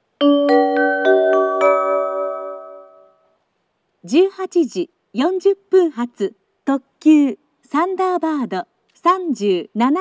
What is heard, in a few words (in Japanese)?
limited express, kyoto station; thunderbird37; kanazawa; jr-west